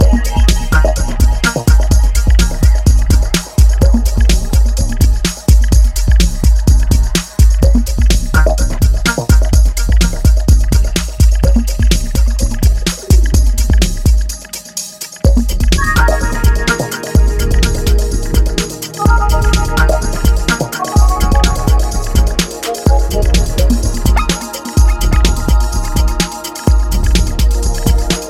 Flute

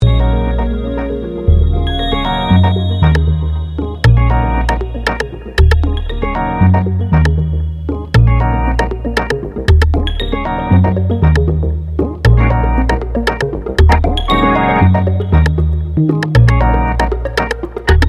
Organ Track

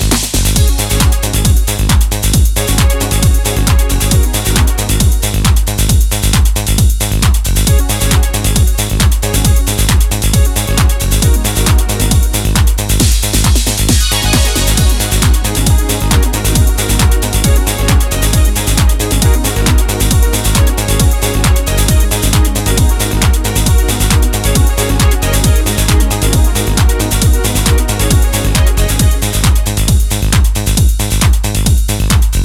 Loop

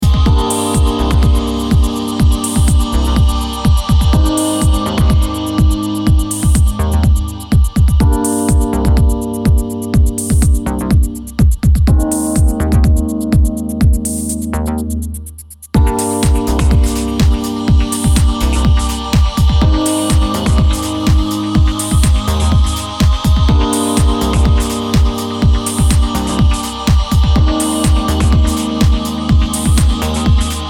Resonant Pianos

